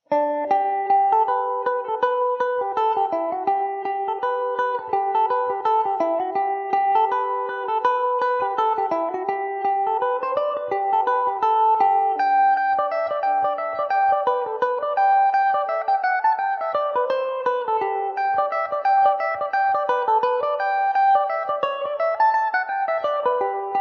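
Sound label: G major mandobird, irish mandolin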